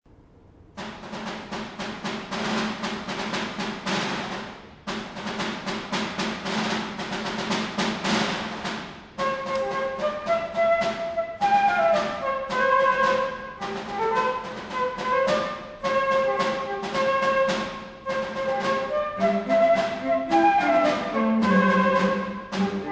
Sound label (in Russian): marsh violonchel fleyta mal. baraban; Pyotr 3-y marsh; A.Antropov